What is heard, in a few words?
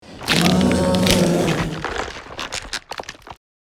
Werewolf fangs growing transformation